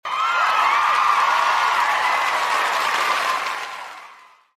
crowd cheer; bale

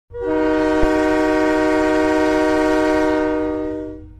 EMD ATSF F7A horn; trainz

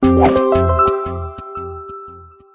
trailer intro; leappad explorer

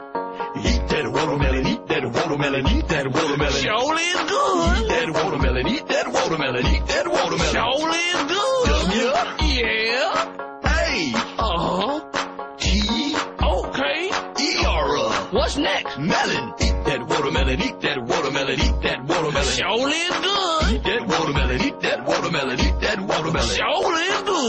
watermelon song

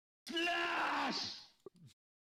slash; moman

